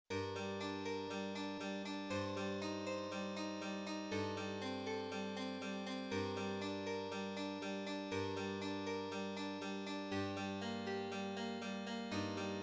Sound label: mt32, dosbox sound emulation